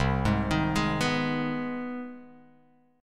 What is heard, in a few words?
C Minor Major 7th Sharp 5th C down Guitar Open F; arpeggiated; JGuitar